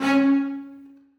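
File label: celli staccato c2; Sonatina Symphonic Orchestra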